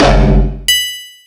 Multi FX, Drum Machines, E-MU Pro-Cussion

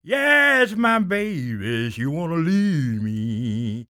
SING, Blues Soul; Spectrasonics Vocal Planet

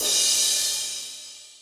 kit, Yamaha Motif-ES8

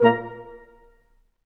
BRS Quintet stac; Roland L-CD702; Quint.Stacc

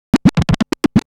Scratch Fills 140BPM

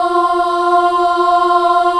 F3 child ah, choir; USB Soundscan vol.28

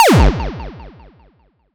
Zapps, sci-fi

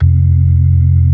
B-3 Club Xfade, Keyboards of The 60's and 70's

